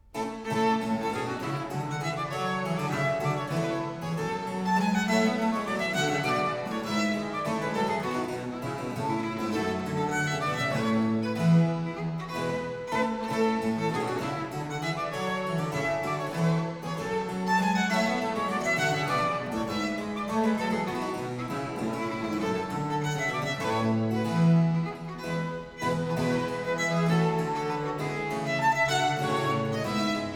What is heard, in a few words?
Sonata and Suite, for violin, viola da gamba & continuo in B flat; Courante; German Chamber Music before Bach